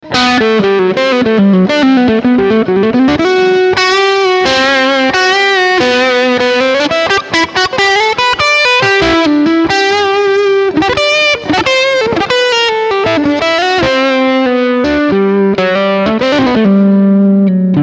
vox tone garage v8 lead

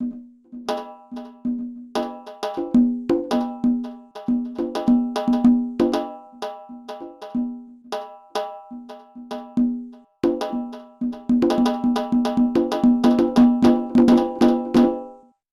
korg cliphit percussion

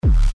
kick tube more control

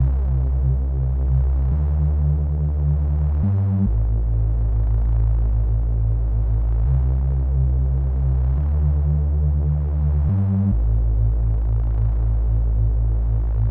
lfo filter slow

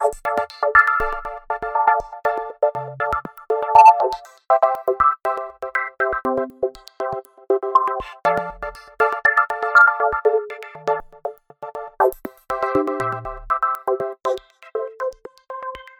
pad resonant

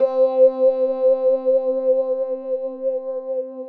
clavinet

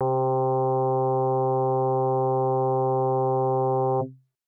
sine sinemod; fm-synthese erklaerung

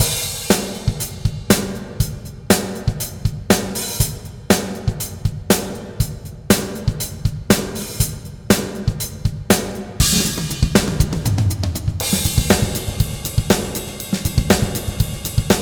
fabfilter pro-r drums concert hall

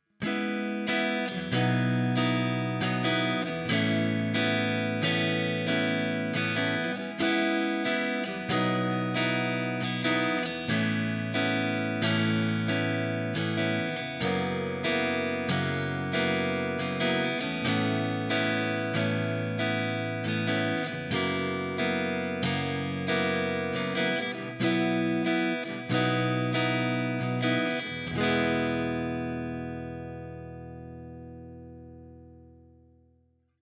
harley benton fusion 3 hh roasted ssp; Harley Benton Fusion-III HH Roasted SSP

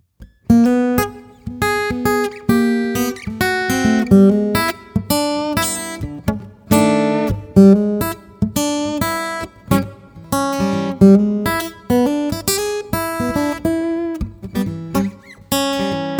blues picking, Westerngitarre; Baton Rouge AR22S/ACE

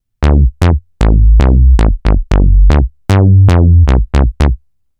funky bass; novation peak test

